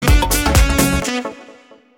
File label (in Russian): sax sms korotkiy zvuk na sms soobschenie